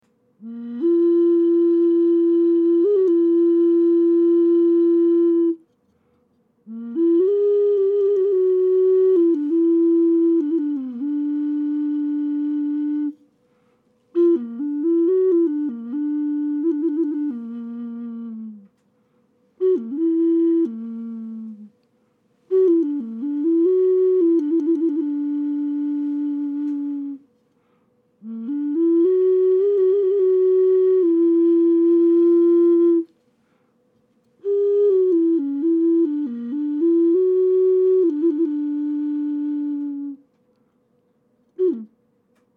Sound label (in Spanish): ocarina de barro grave